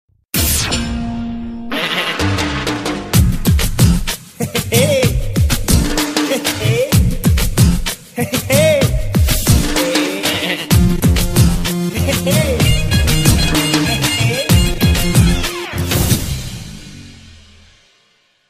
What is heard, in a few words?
Funny Goat Bgm